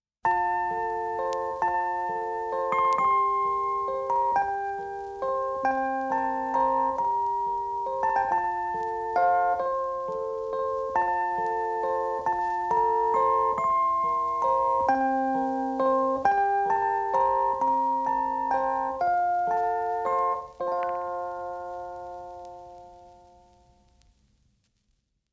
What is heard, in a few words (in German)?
Stimmungen Wiegenlied Mit Keyboard